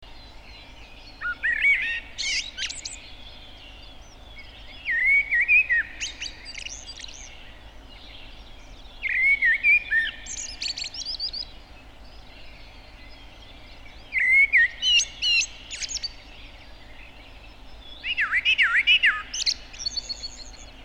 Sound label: merula; FIN